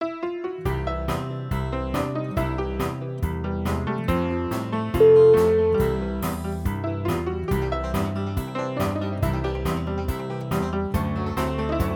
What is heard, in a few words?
comedy ragtime